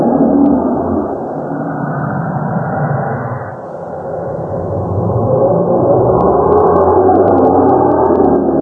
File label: Meow; Doom 3